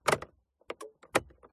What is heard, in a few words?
Citroen C2 Dash Buttons Knobs; MKH8060; PMSFX